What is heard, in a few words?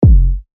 Kick F#; TECHNO; Plutonium